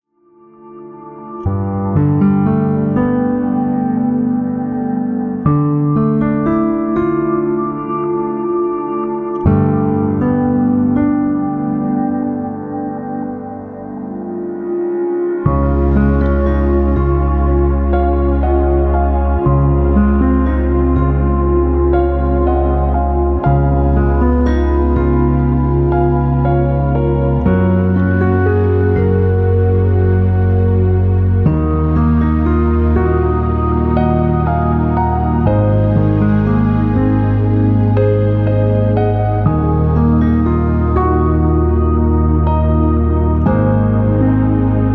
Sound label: Relaxing